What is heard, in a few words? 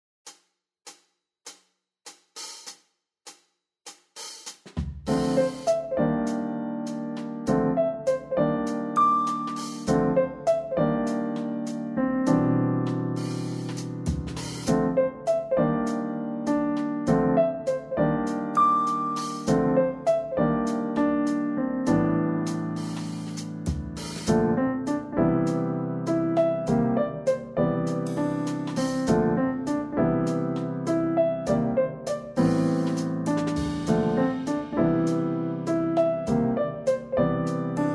Three White Keys II Pattern C